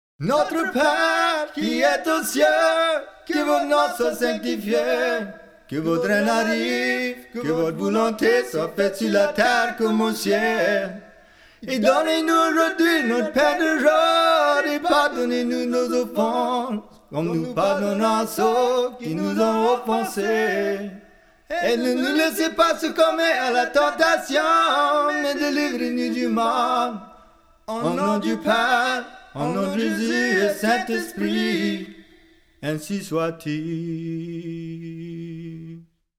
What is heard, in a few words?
Notre Pere Chant